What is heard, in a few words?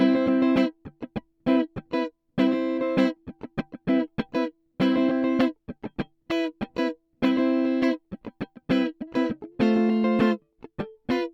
Guitar Rythm Peak -10dB 250Hz